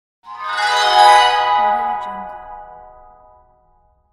harp

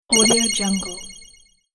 collect swoosh bonus

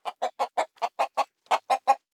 poultry chicken; soundbits-soundfx

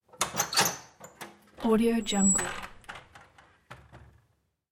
household shutters window wood open

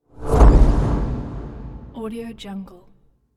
futuristic thud impact

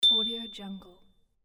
ding

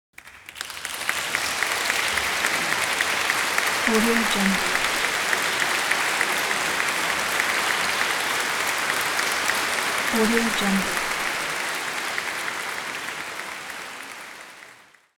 applause

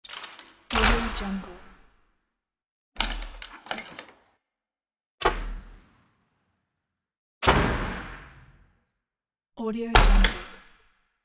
door open

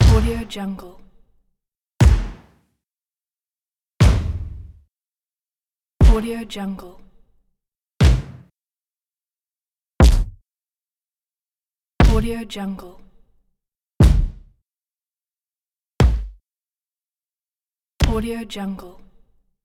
stomp

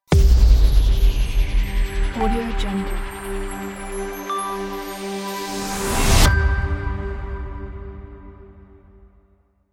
logo reveal

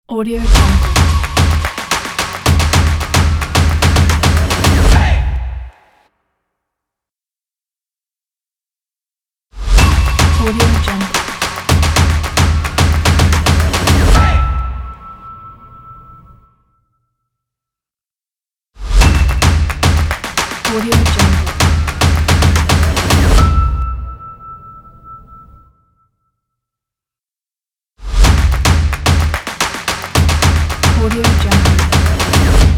action stomp clap logo

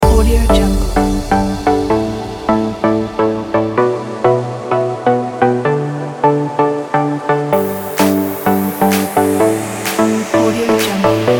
an electronic

